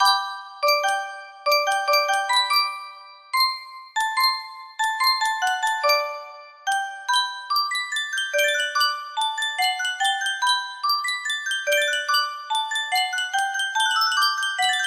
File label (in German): spieluhr, music box melody; mozart eine kleine nachtmusik